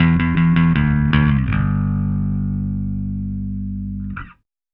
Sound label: Happy Pub Bass Ending, Indie Rock; Sonic Foundry (Sony Creative Software); Indie Rock Anthems; Session 05 - Happy Pub